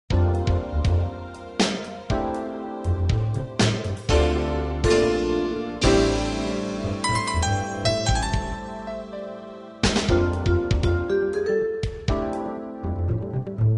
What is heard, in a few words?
light jazz